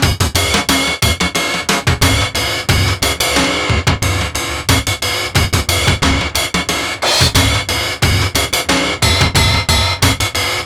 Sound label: Beat, Drums, Eclectic Electronica